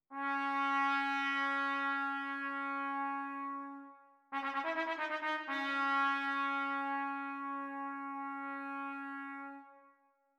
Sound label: Trombone Fanfare